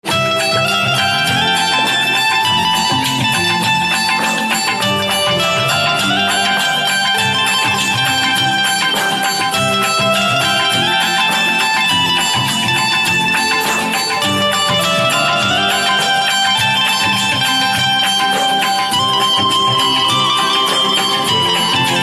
Banjo Instrumental Ringtone

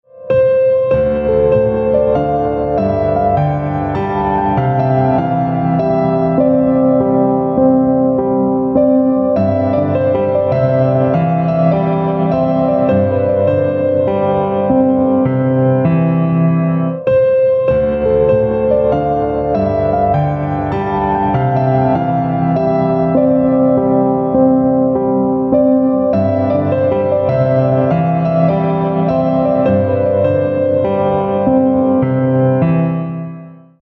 piano instrumental ringtone; south indian instrumental ringtone

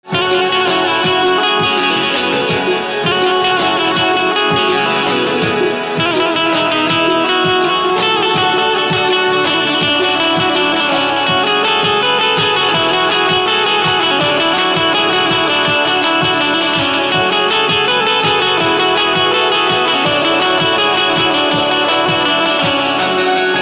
Banjo Instrumental Ringtone

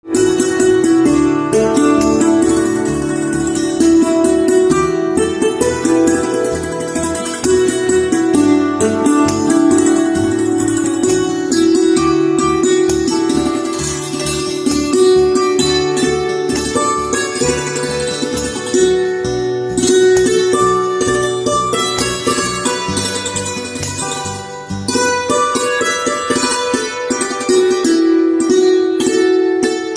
Santoor

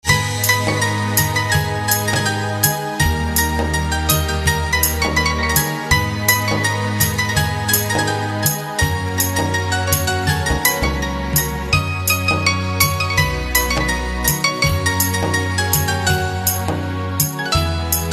Piano Instrumental Ringtone

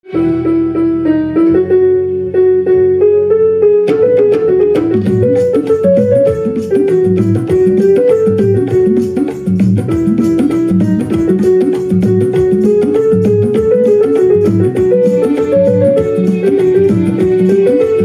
Guitar Instrumental Ringtone

